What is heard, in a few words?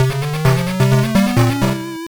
Level Complete; Spider-Man: Return of the Sinister Six; Master System